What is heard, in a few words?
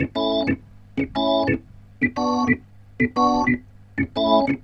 Roots Reggae, B-3; Sounds Good